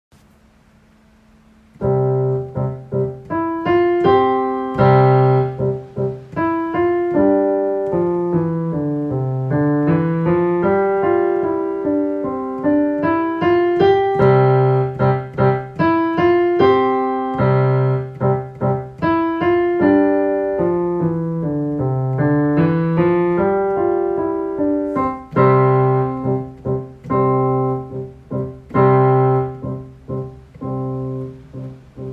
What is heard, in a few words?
Beat The Drums